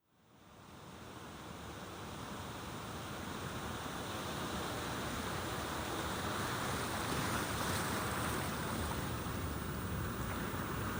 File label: Ocean